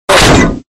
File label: hit